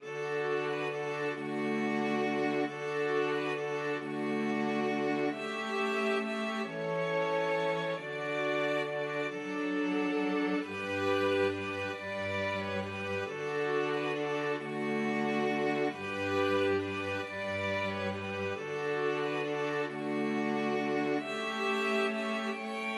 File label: string quartet